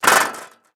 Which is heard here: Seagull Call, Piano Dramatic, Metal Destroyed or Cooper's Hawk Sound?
Metal Destroyed